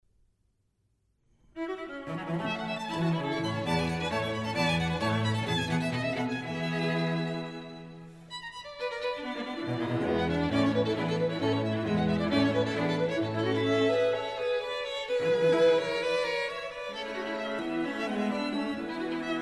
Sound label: String Quartet, String Quartet in A major; String Quartet in A major, Op.18 No.5 - 4 Allegro